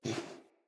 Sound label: Wolf Cub Sleep Idle Yip; Orengal